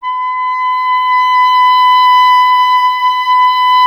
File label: clarinet B, Solo Instruments; Miroslav Vitous - Solo Instruments VOL-1; 90 s Sample CDs